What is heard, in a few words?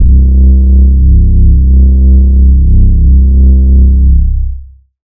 Bass; Diamonds